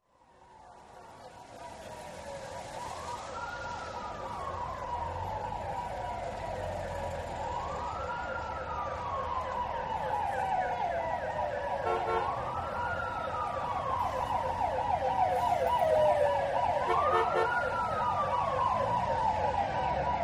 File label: Siren In And By Close, Away Into Traffic. Both Wailer And Whooper Sirens. Traffic Ambience With Sparse Walla.